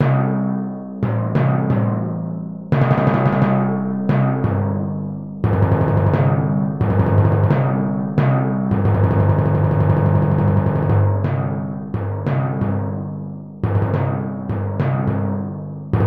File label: Timpani; Region 23 Middle School Etudes for 2026-27 (Year C)